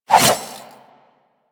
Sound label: Nikana Spin Swing B; Revenant